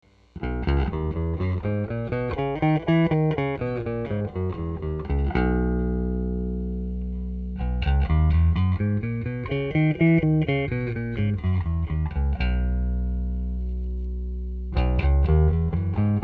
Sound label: bass